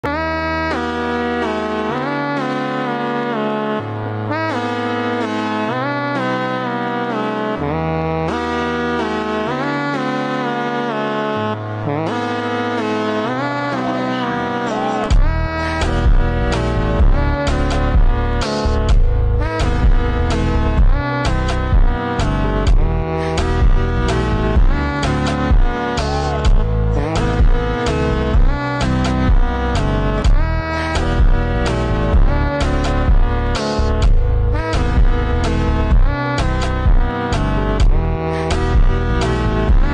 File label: kompa slowed song